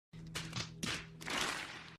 facepalm effect made with Voicemod

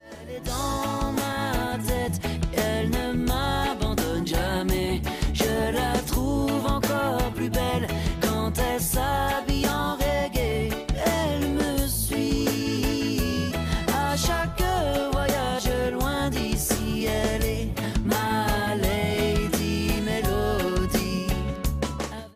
Merry Melody

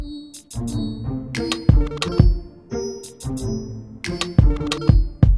loop

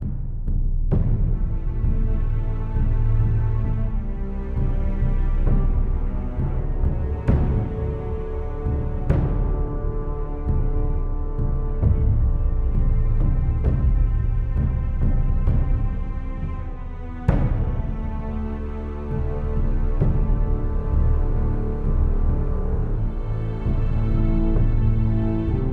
Pause Menu

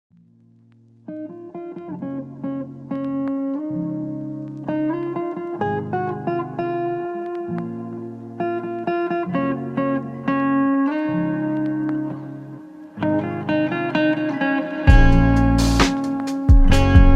lofi

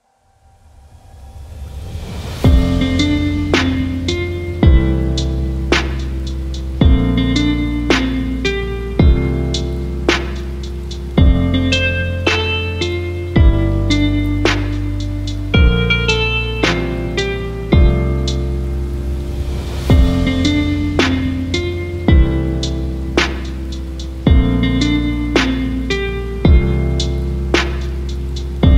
HAPPY BIRTHDAY INSTRUMENTAL LOFI VERSION

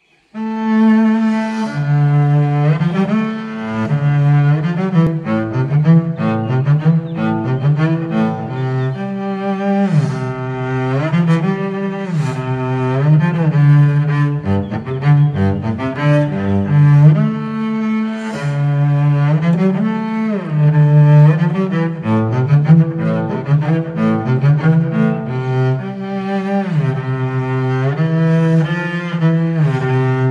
Theme on Double Bass; GOT

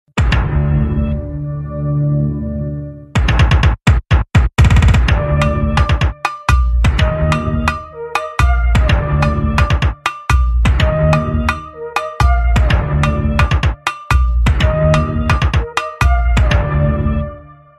Funk instrumental

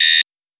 buzzer fail

